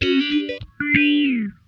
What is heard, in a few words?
SOFT FAZE, Funk Guitar; 90 s Sample CDs; Zero G